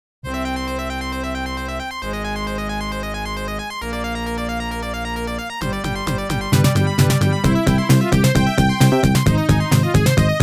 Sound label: bgm